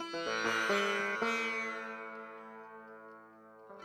SITAR ETHNO 125 A, Guitar; eJay

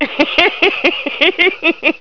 laugh; DrMozek

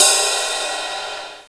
perc ride; Hexen Demo; Hexen Music; Hexen 6vox

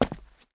kyle run; MOTS

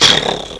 turkey; Little Red Riding Hood

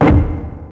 hwoo

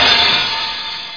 cymbal

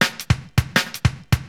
DRUMLOOP D+B 160, Drum+Bass Loop; eJay

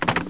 hang up; Gizmo Project 4.0.4; interface sounds

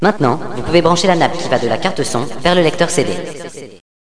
kit; Villagina; Village; ECOLE

